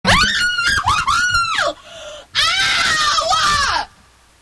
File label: scream